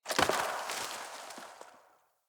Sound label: Wetland Forest Day Branch Break; Midrath Island; Ambience